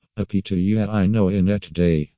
cry

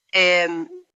ehmmm

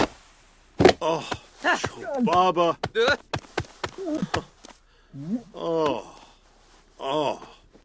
sarcastic suffocation

